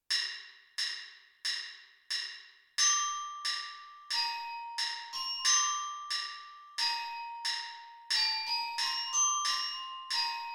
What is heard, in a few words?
orchestra bells part b